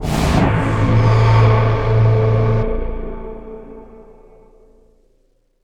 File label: Combo Sting